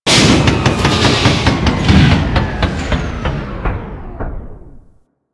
Ocean Pump Machine Loop Shutdown, Hallway Electric